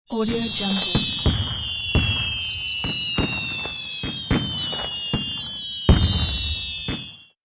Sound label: firework